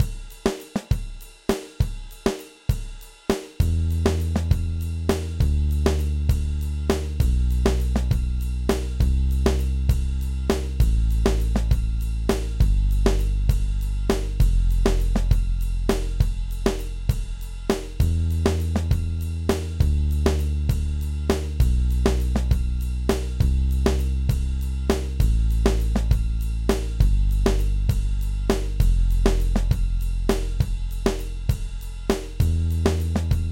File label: Backing Track